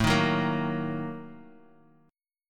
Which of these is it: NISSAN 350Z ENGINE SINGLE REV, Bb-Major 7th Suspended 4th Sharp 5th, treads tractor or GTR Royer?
Bb-Major 7th Suspended 4th Sharp 5th